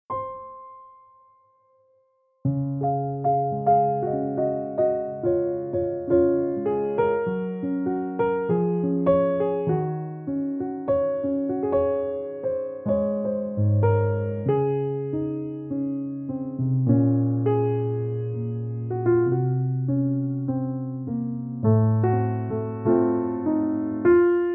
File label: Coffee House Jazz Piano